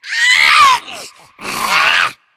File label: spitter alert; voice; source engine; left 4 dead 2